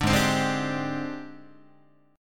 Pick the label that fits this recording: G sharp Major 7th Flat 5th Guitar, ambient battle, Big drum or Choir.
G sharp Major 7th Flat 5th Guitar